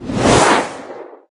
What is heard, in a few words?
attack